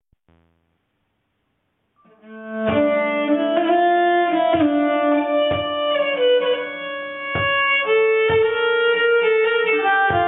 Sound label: Polska, nyckelharpa; Polska Fran Ore